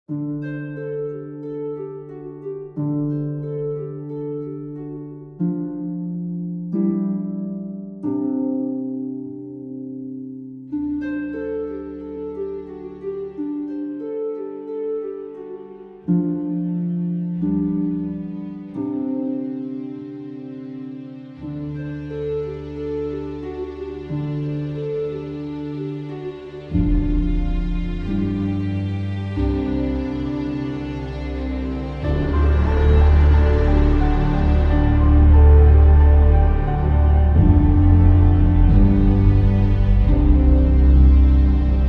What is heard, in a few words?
library